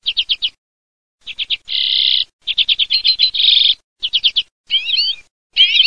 ORGANETTO

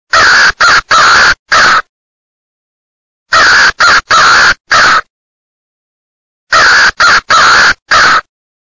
CORNACCHIA MASCHIO

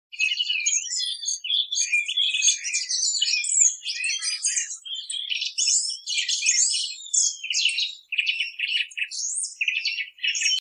Tordo capanno

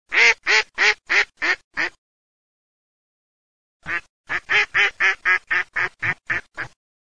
GERMANO

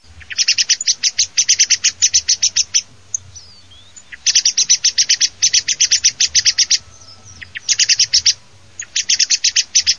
tordo

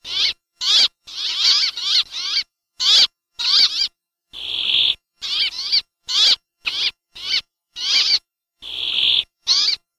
peppola, birdsound

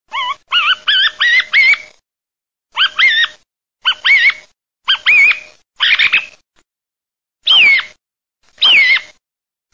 TORDO

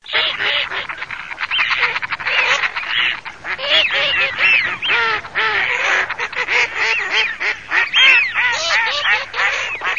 germ alz fem fischione, birdsound

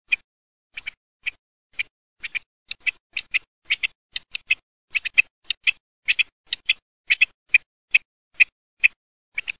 COTURNICE

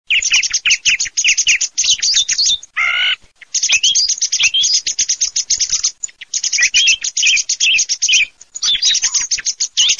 TORDO SCHIAMAZZO

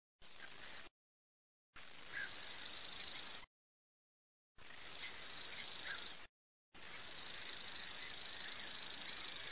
FORAPAGLIE MACCHIETTATO

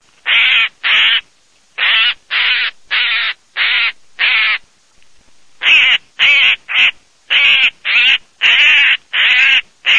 gazza bosco

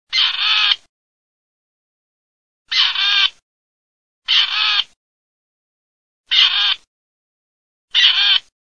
STARNA PERDIX

